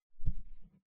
ストン

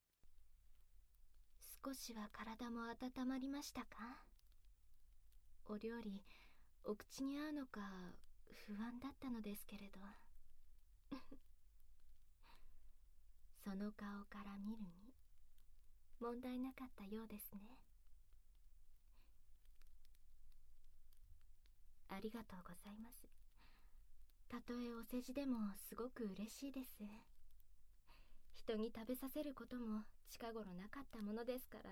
膝枕とキス

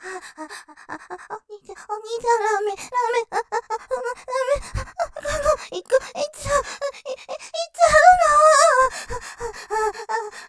絶頂寸前 いっちゃうスパート, ASMR; ステレオ; ループで楽しむ！シェフの気まぐれ抜き抜きコース

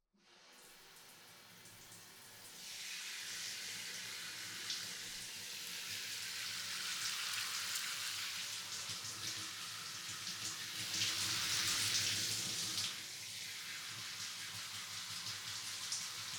お湯 で 泡 を 流しながら 頭皮 マッサージ, ASMR

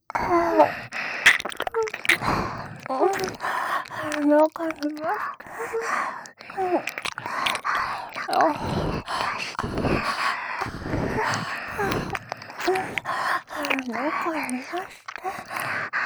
中に出して 耳舐め喘ぎ; ロッテ

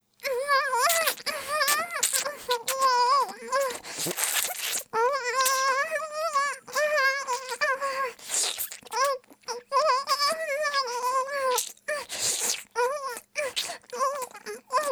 淫乱系音声素材, イラマチオ; Honey Drop

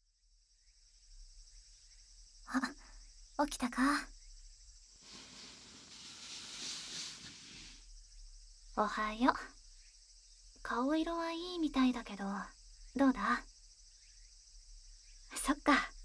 いつも の 耳かき; 未来編1

